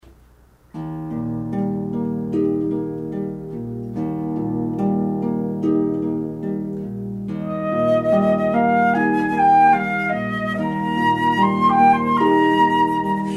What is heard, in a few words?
harp; Bizet